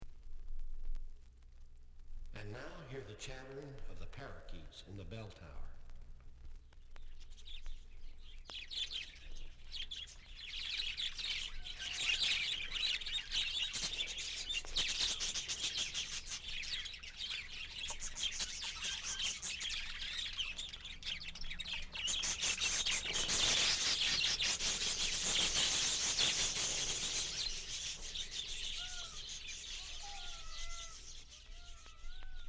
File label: Selected Sounds of Tiki Gardens